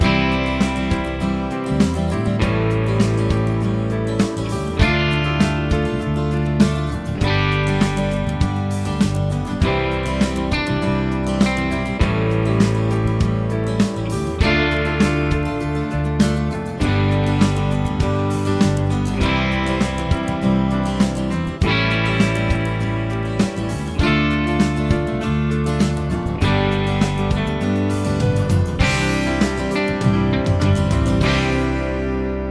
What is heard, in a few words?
progs with applied chords